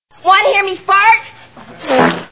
fart

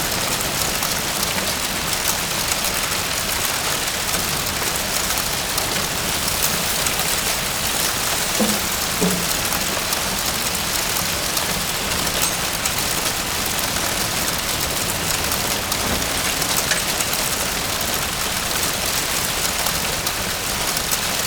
ambience disaster fire

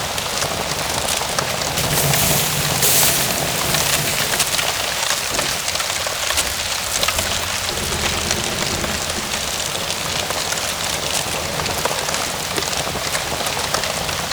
ambience fire

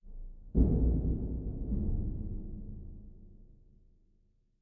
metal hit far

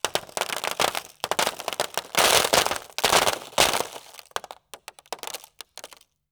small debris

